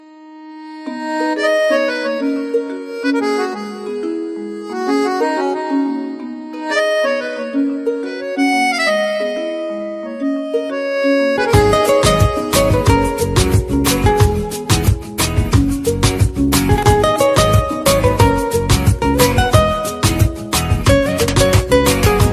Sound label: Instrumental Ringtone